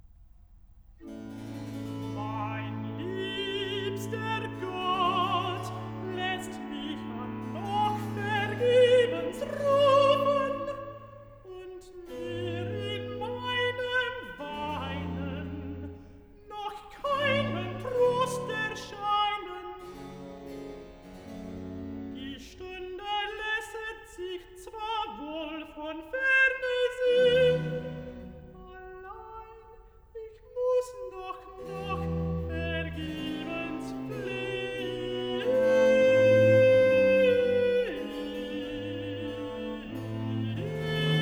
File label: Cantata, Recitativo (Alt); BWV 13 'Mein Seufzer, meine Tränen'; Bach; Greenwich; 2nd Sun After Epiphany